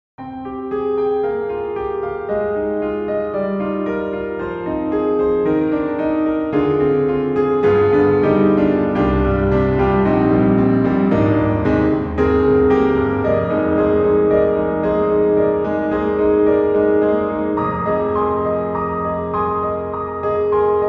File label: CFX CONCERT GRAND Contemporary Dark and Distant; GARRITAN